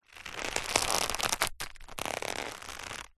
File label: foley rope old thick movement twist stress creak; zapsplat